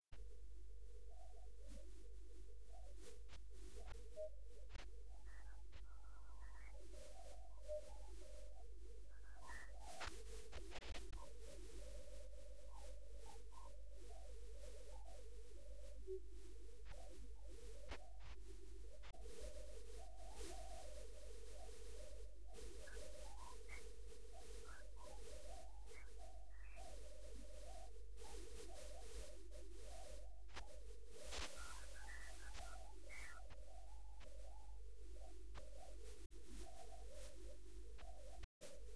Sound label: clapping